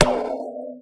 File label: Laser